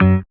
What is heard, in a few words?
Synth Bass, Roland